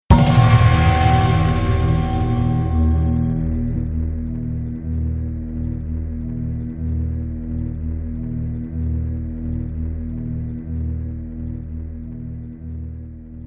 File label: round over; wasteland; the pit ii